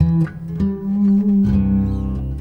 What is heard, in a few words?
Bendo There Gtr, Guitar Only; Sonic Foundry (Sony Creative Software); Friendly Fire